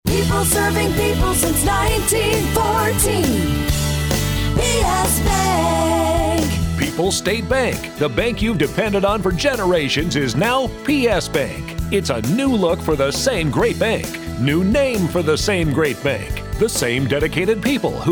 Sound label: Jingle radio; PSBank